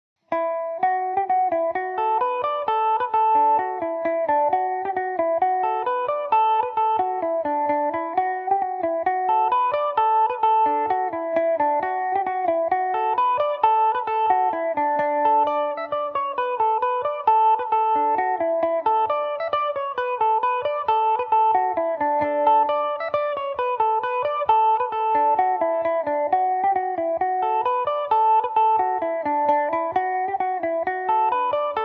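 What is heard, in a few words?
paddy o sullivans polka D major revelation, mandolin; Revelation Jaguar electric mandolin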